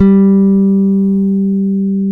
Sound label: G-3, FINGER BASS; AKAI S-Series CD-ROM Sound Library VOL-7; FINGER BASS1